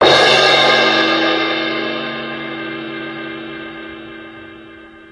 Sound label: crash cymbal 2x